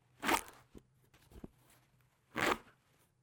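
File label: zipper fastener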